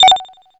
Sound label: Little Pop; Jungle Warfare 1&2